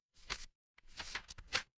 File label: paper fabric page turn